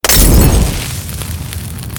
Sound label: fiery explosion, light bomb; explosions